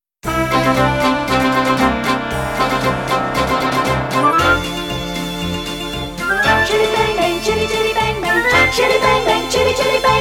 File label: instrumental